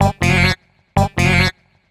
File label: guitars; Vocal House; Instruments; PS-28 Vocal House Samples